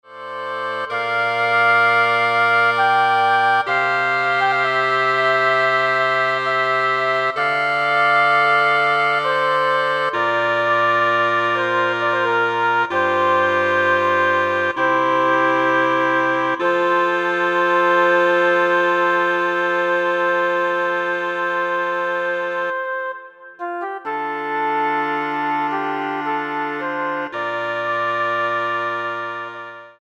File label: Woodwind Ensemble